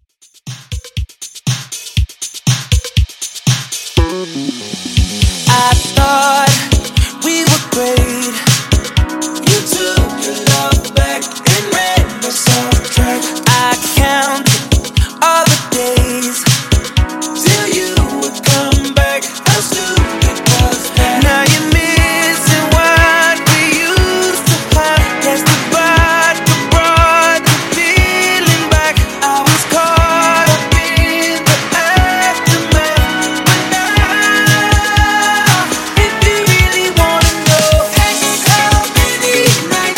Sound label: Funk Redrum 120 Bpm